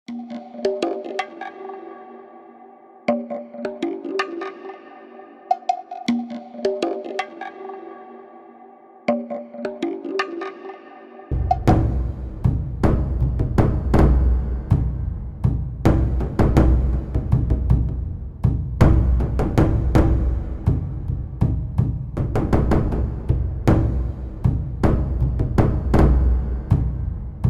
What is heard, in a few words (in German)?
Schamanische Trancemusik